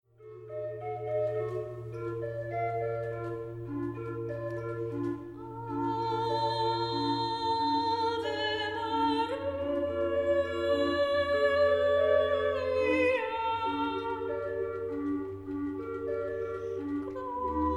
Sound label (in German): Gesang, Geigerin; schubert; trauergeigerin